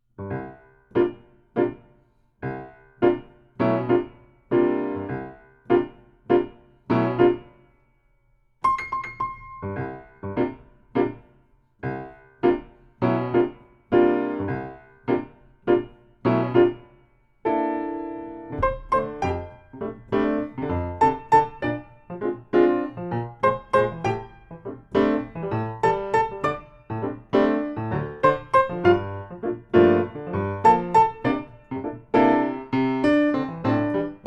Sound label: Comical Theme, Piano Solo